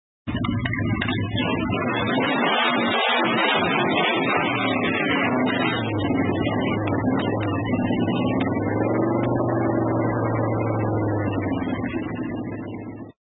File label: Harley